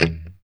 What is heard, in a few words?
POP E2, STRAT guitar; Spectrasonics; Hans Zimmer Guitars VOL-1; JC-STRAT POP